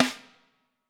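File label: snare drum, Drums; Bob Clearmountain Drums I; SD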